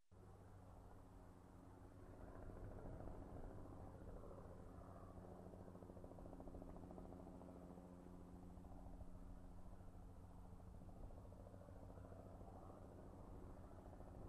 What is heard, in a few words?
Helicopter flies slowly